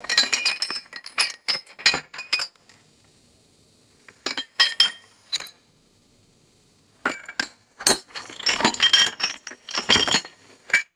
glass bottles clinking and rattling together

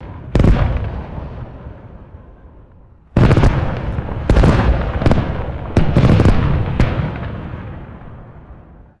distant fireworks exploding with crackling tails

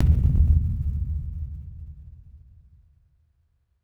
low pitched synthetic beep with reverb; beeps

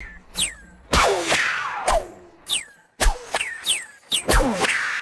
sharp bullet ricochets and dirt impacts; bullets

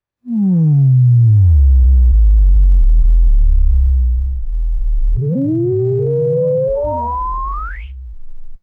sci fi electronic door opening and closing; doors

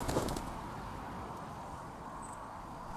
raven wings rapidly flapping by

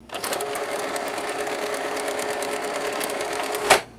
mechanical copy machine whirring and grinding; machines

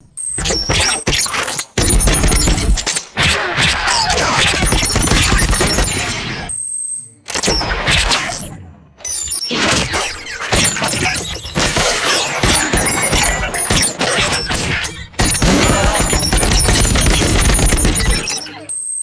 rapid high pitched synthetic ricochets

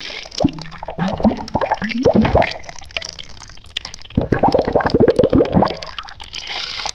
water gurgling inside a hollow pipe

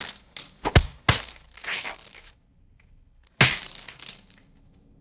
sparse wet rubbery splats on concrete; rain

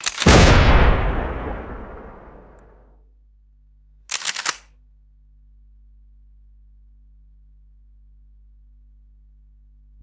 mechanical click layered with heavy boom; explosions

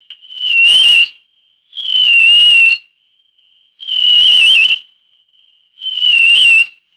short squeaky common toad calls; animals

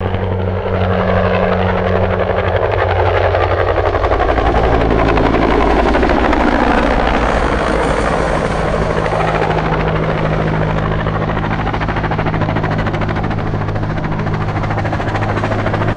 deep pitched down helicopter flyby; aircraft

